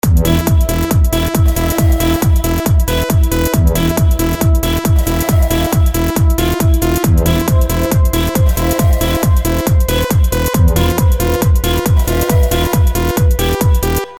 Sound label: hard trance